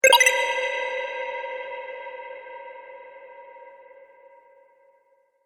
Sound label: Game Cavern Tokens; Video Game Sound Effects